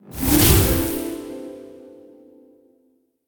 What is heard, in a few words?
colossal Transform; strollingstaxx